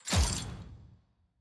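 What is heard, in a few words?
login notification login fail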